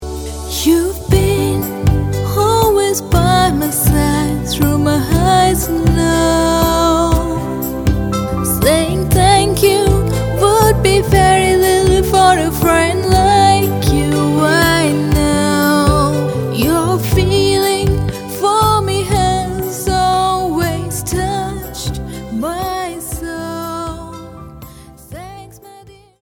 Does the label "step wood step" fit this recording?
No